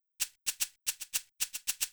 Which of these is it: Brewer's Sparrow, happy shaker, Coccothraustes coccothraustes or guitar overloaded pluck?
happy shaker